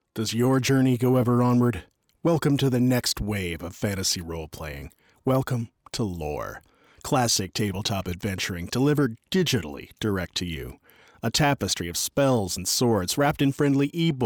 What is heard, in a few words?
Vocal